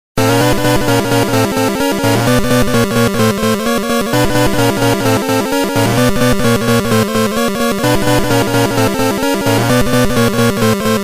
miss jingle; Rain Shower

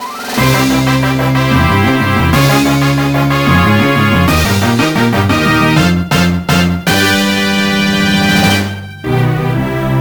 The music for winning

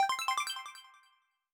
1-Up, Sound effect of 1-Up; SMBW; Badge Shop

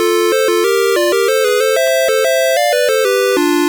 Jingle; Stage 19

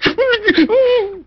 Dogadon's Cry

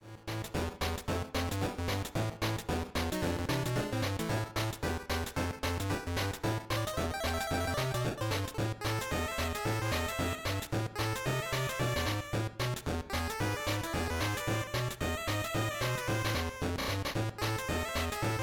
Jungle theme; DKL

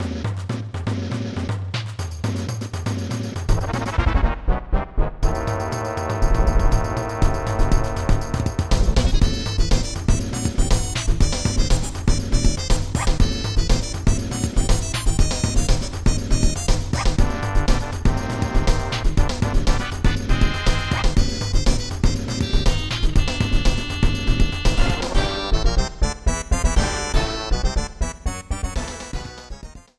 Fight against a Stronger Monster

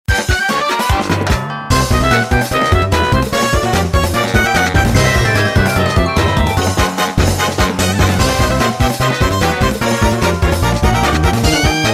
Jazzy; MP6

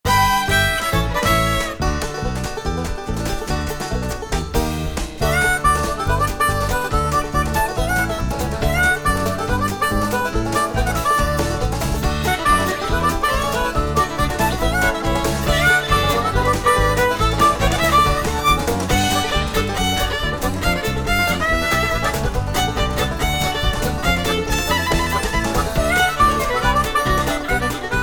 Country Arrangement; SMW Ground BGM

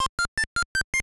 1-Up theme; Smbgw

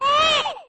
Baby Mario cry

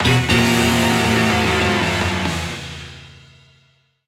Impact

